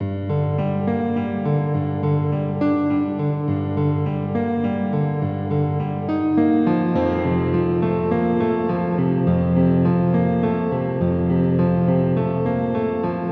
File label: Piano